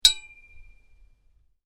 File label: Metal impact: light hit on hollow metal pole; Miscellaneous Impact; Stuart Duffield